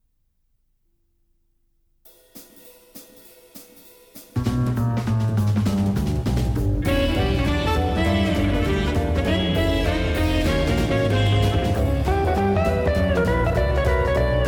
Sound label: jazz gold-plated tape; gxz7100ev-C